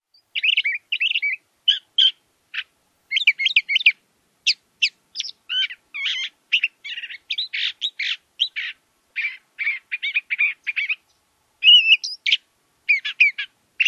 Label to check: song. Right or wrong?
Right